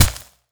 bullet hits ground; soil

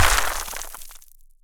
rock debris

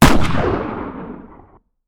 magnum exterior shot

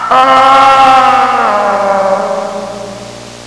human male scream

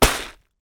plastic bag carpet drop

